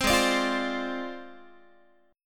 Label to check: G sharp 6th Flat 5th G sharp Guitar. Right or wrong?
Right